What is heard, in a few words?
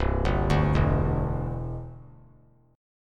C Major Add 9th, chord; arpeggiated